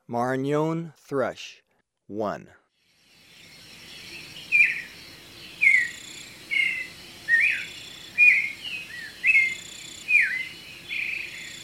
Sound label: Maranon thrush, Birds of Ecuador; S. America